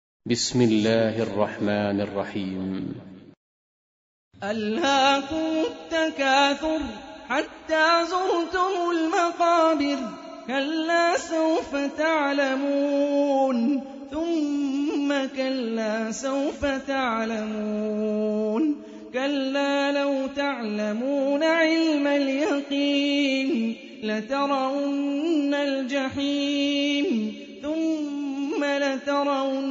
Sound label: Quran; Hani Refaey